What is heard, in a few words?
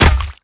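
hit body, crossbow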